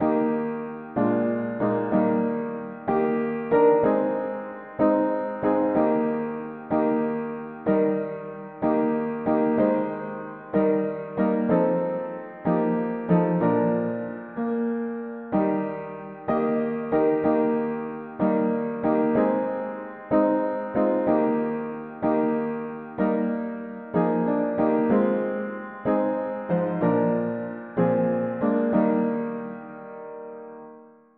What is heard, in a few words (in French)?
mono piano; C est notre Dieu; hymns